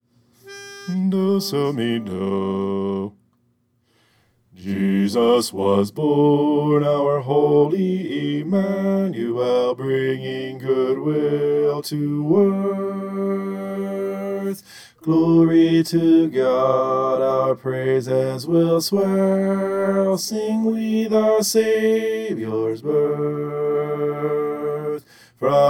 bass

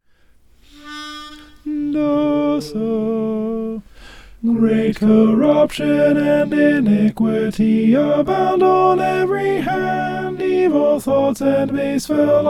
alto

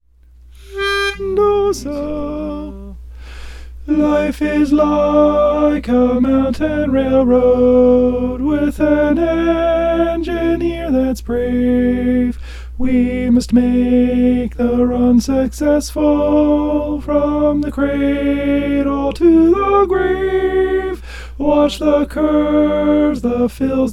alto

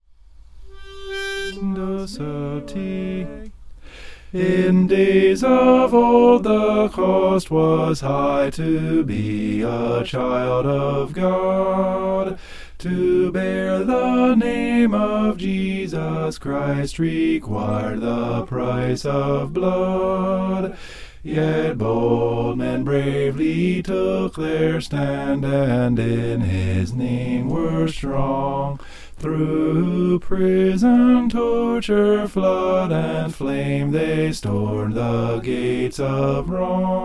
bass; in days of old

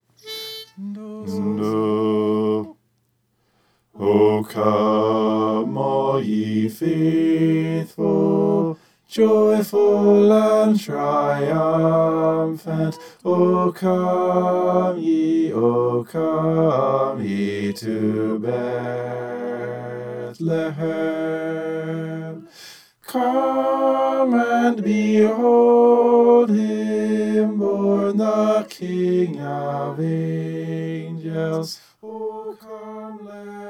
bass

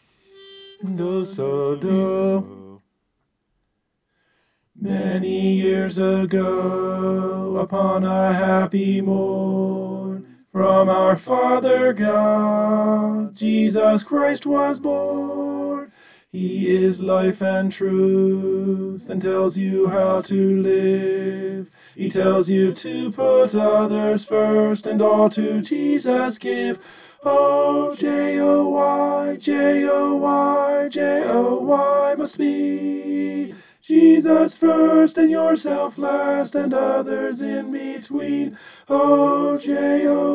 christmas j-o-y tenor

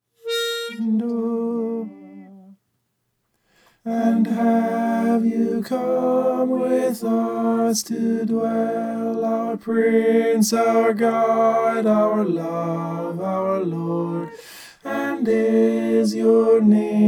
tenor

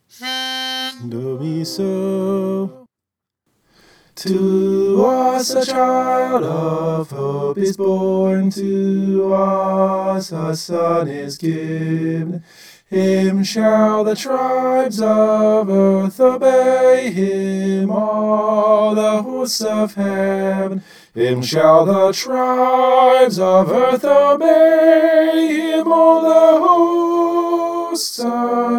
soprano

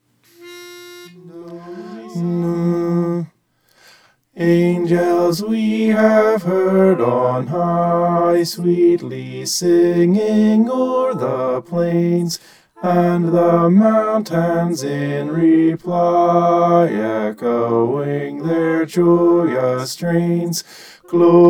bass

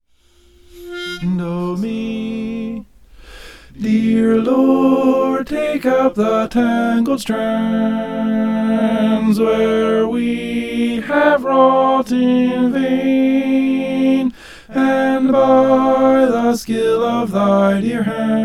tenor

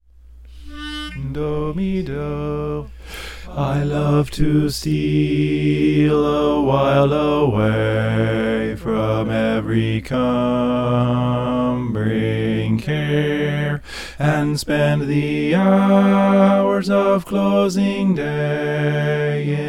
i love to steal bass

